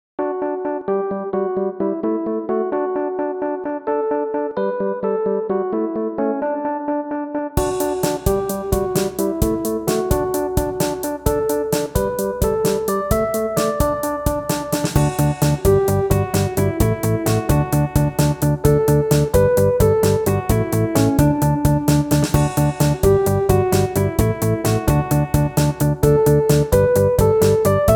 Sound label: Title Bgm; Gameton; Arcade additional sounds